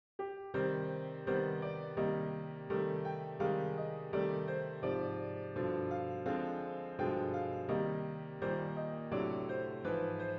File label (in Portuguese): Estudo de Harmonia acorde de sexta